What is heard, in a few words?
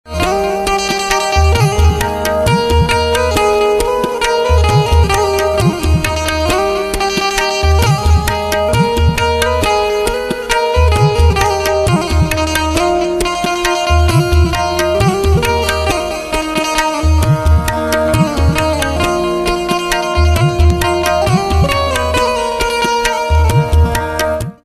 Banjo Instrumental Ringtone